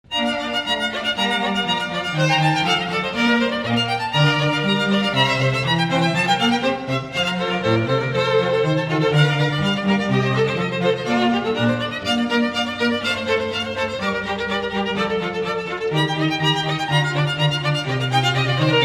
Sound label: String Ensemble